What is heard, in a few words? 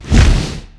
wings; hl1 npc; stukabat